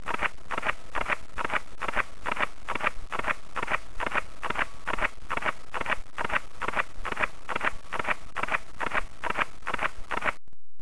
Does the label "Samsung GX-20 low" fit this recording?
Yes